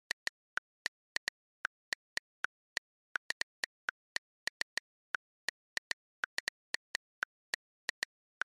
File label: Smartphone Typing Fast